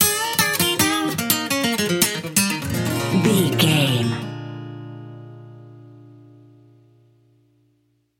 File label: too busy blues bumper